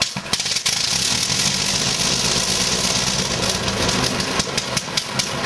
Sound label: cranking; Bombable; flightgear